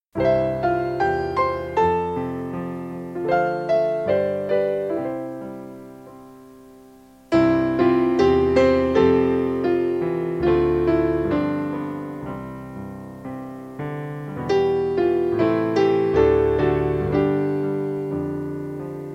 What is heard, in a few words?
instrumental